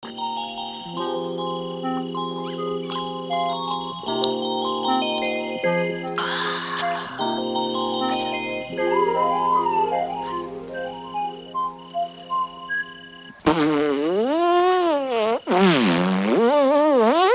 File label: fart; soupe choux